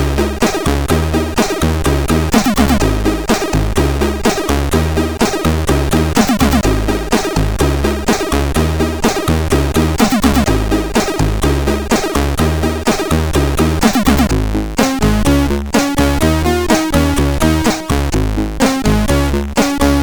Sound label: Music Sample, sid CSG8580R5